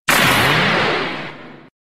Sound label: aura disintegrate; dbz